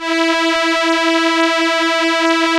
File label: STR ELKA, Elka Strings, Elka Violins